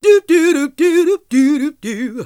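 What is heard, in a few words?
Gospel; Best Service Gigapack 1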